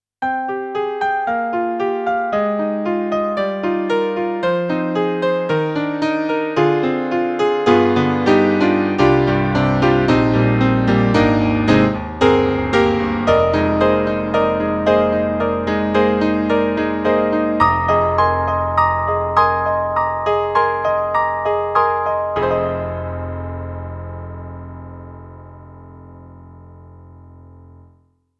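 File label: General MIDI Piano; Roland SK-88Pro; GM Piano1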